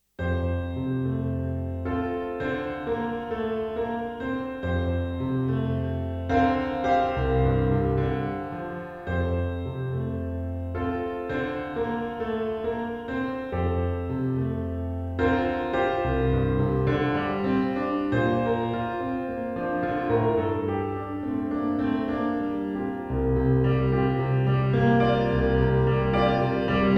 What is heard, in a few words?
piano pop